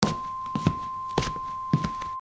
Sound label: step